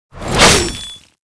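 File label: electric attack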